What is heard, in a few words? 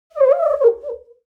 foley clean window paper towel slow howl; smartsoundfx